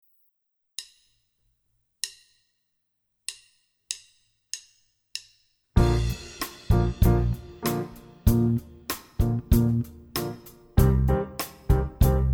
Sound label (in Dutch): Speel- en klapduet